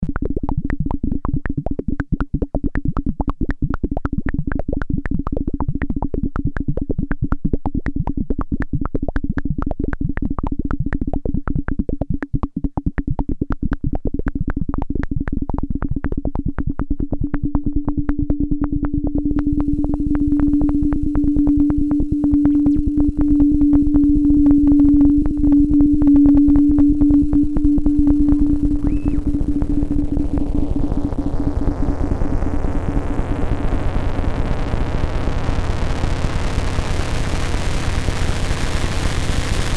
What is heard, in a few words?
rain; Shorts: #29 Raining Up